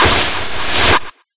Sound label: Absorb; life of a naomi; Naomi Carrigan